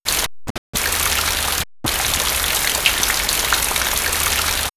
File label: Nature Rain; X-Static Goldmine